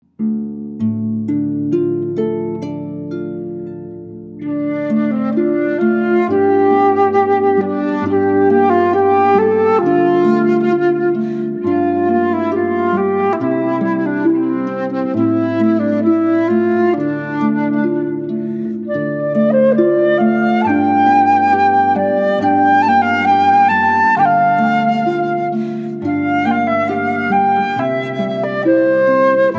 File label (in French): Morceau Traditionnel Juif Flute et harpe